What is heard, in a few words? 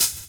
Hhat, Hihat; Equipped Music; Loungin House